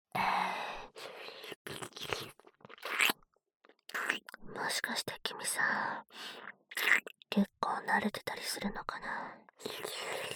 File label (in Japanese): キス; やっぱり調子狂うなぁ